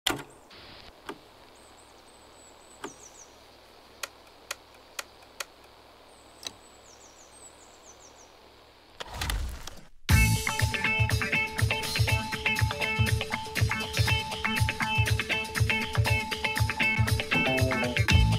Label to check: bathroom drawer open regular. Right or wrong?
Wrong